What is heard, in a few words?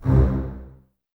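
Basses C 2 Staccato; String Ensembles; Miroslav Vitous; 90 s Sample CDs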